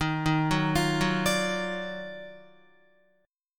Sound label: D sharp Minor Major 9th D sharp down Guitar; arpeggiated